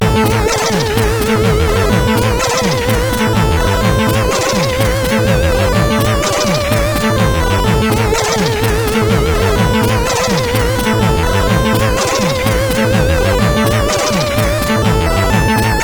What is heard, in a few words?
X-Music Remix, CSG8580